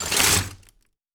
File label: Glass scrape